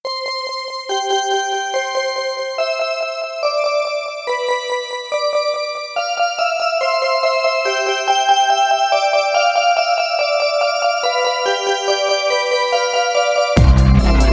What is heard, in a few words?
dubstep lite xmas holiday bells beat 142 bpm